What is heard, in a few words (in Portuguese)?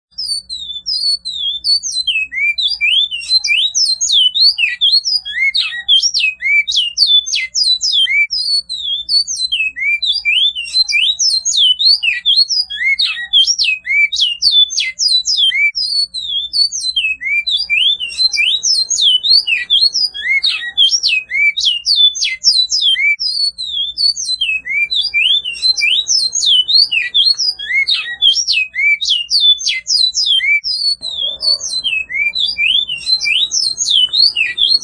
canto bicudo flauta